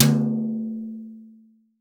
TOM, CTD TOM BRSH

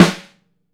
LOW TUNE, SNARES; Sampleheads - New York City Drumworks VOL-1

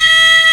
HEAGUM C 4, KOREAN; AKAI S6000 CD-ROM - Volume 1

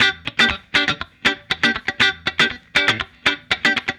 Guitar, 70s Analog Funk

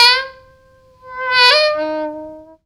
FUNKY SAX; Zero-G; Funky Elements

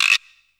PC, Casio CTK-5000

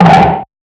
Snares, Alesis Performance Pad

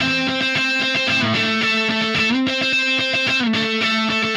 Guitar, Indie Rock; Session 01 - Brighton; Sonic Foundry (Sony Creative Software); Indie Rock Anthems

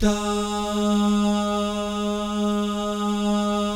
DAH, Vocal Jazz; ILIO

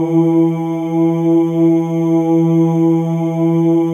MALE OOS, Symphony of Voices; Spectrasonics

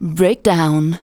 vox, Techno Trance; Ueberschall

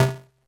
Snare, Drum Machines, Korg DS-10

